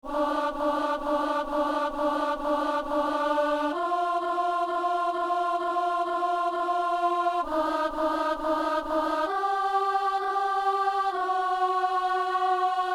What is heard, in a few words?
alto; Messe de San Lorenzo - Kyrie